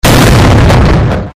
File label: silent pistol; mvm forever